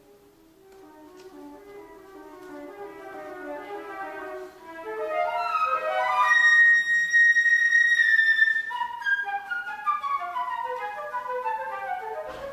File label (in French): Sonate flute traversiere